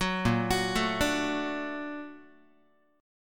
A sharp Augmented Major 7th A sharp down Guitar, A#+M7 chord; arpeggiated; JGuitar